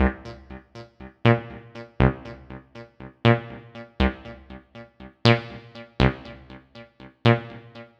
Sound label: 303 loops